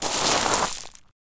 stopping suddenly on gravel

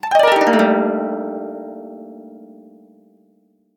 japanese style

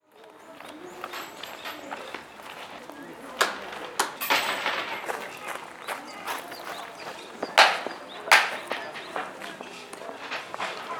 bustling japanese shrine

